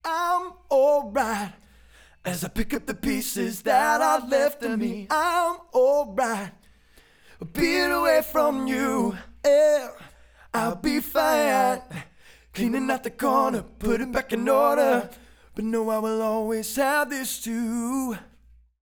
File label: Backing Vox